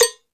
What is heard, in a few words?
Sluggo Drums, Drum Machines